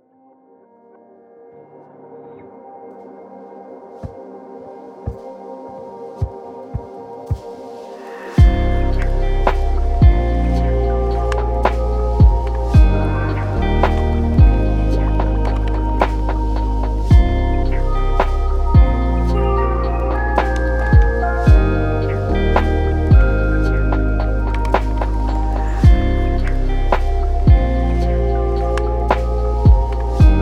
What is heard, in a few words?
lofi hip hop